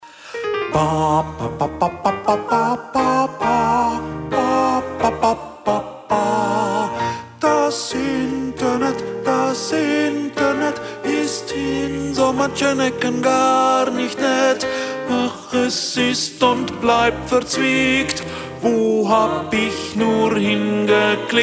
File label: Tenor